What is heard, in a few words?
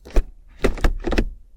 shifting gear; car